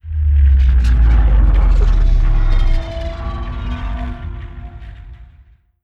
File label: machine empire titan death; Stellaris; synthetic dawn